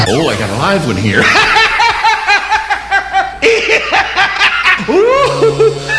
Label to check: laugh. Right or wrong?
Right